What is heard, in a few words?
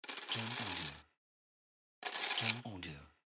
Dropping Coins